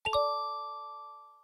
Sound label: Windbell, notifications; Meitu V7